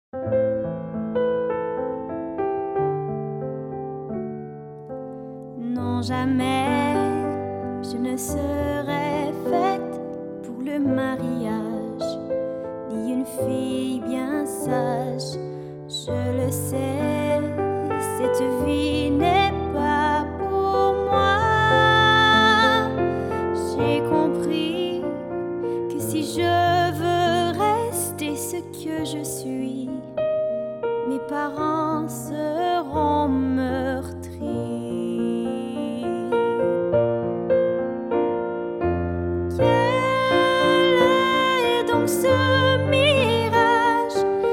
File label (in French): chant; Québécois, Acadien, Gaspésien, International, Parisien, Marseillais, Américain